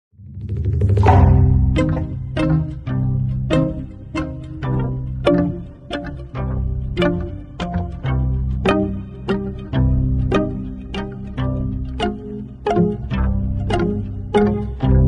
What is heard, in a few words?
Frog Series Music; Ghorbaghe